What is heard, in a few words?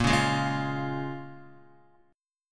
Bb-Minor 7th-Bb Guitar; Bbm7 strummed